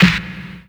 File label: dark sounding acoustic snare sound f key; snare drum samples